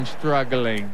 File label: struggling